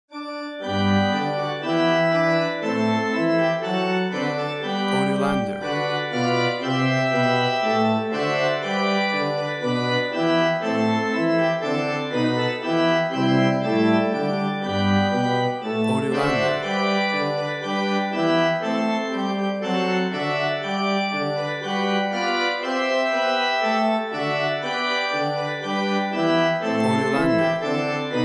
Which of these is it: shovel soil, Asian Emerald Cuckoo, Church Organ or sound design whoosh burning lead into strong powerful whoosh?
Church Organ